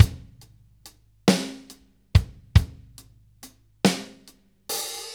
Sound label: 6/8 Loop, Drum Tools, Laid Back